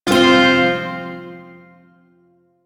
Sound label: Shawzin Chromatic Chord; Warframe; Duviri Shawzin